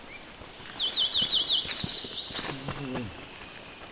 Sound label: E chrysophrys